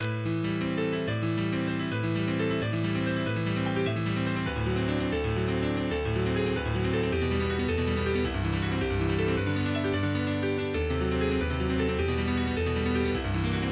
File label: Prelude in G major